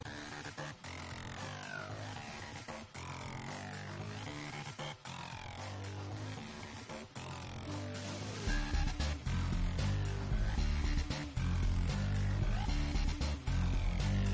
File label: Ninja Groove; Save the Ninja Clan; PS Vita